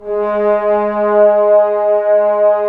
BRS BNS, Roland LA Composer; Roland - LA Composer vol. 4; Tbns

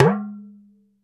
Talking Drum hi up; E-MU Producer Series Vol. 7 – Old World Instruments

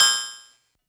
Agogo Ring Analog; Lost Tapes From Mars

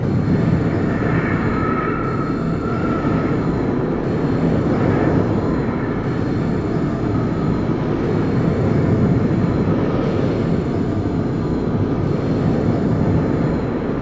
Enviornmental; Sonic Foundry (Sony Creative Software); Ugly Remnants VOL-2; UR 2 FX (Long)

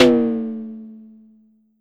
Drum X Tom; Pearl Drum-X

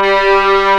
G3 LEG MFI L, String; East West; The Ultimate String Collection

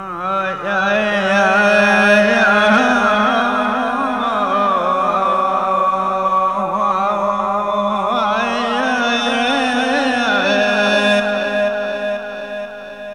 VOX SUNG; AMG Megabass REMIX! VOL-2

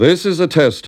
RAVE

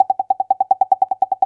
RUN RUN RUN, CARTOON

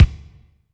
DM5 Kick, Alesis DM5 drum machine

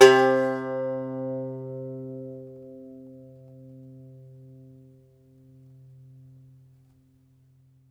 IRISH BAZ, Plucked Strings, Irish Bazouki

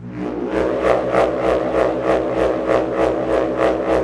SPIRIT CATCHER

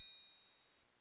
chime

